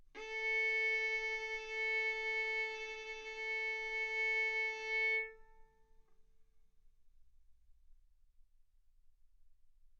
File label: Violin arco mf sul A A4 B4 stereo